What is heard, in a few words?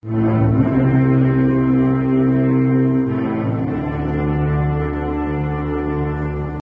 Horn